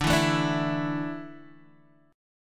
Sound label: D Suspended 2nd D, down, Guitar DADGAD